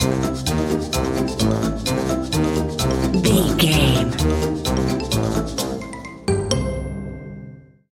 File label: wacky inventor stinger